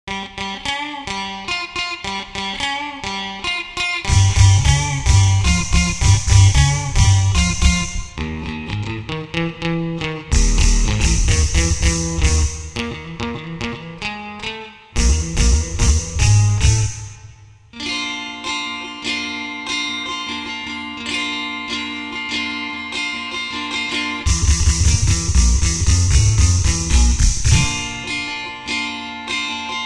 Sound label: guitar